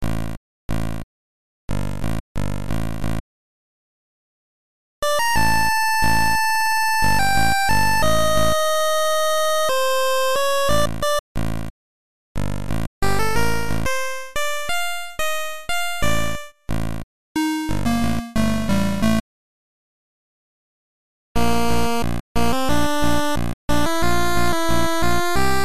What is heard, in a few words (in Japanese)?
洞窟